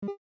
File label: shoot